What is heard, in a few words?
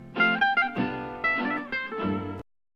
trill; django